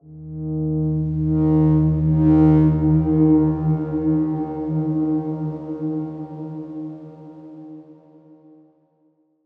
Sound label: Darkswarm D#3 mf; HSS Ableton Pack1